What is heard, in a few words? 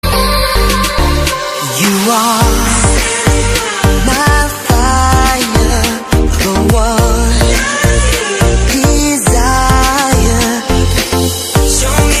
hollywood lovely ringtone